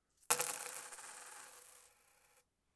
coin drop

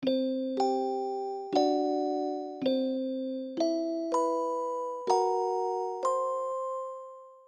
music box short

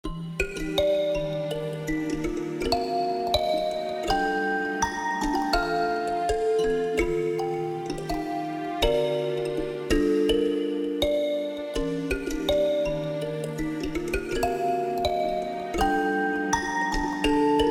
Kalimba

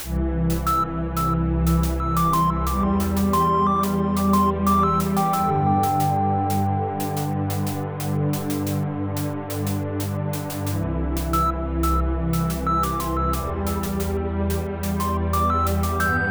Bells And Strings

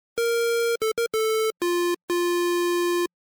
Victory Stinger

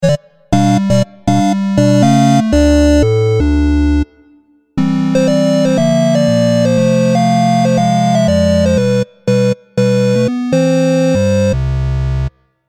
game over; 8-bit pack 2

